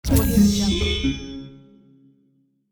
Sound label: harp melody win